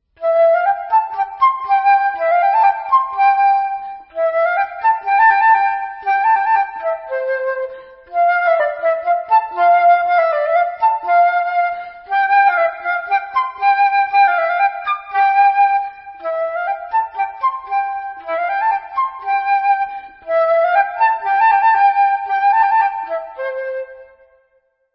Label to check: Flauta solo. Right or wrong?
Right